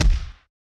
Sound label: fighting punch